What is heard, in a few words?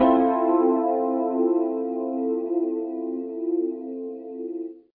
END LOST